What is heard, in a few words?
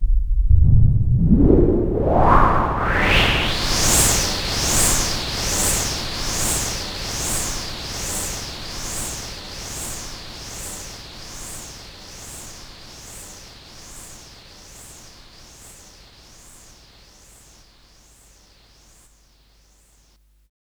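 FX left, attacks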